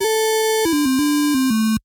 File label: pong game over; toystory; aliens